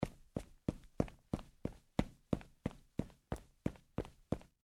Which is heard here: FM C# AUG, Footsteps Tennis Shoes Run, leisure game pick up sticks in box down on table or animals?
Footsteps Tennis Shoes Run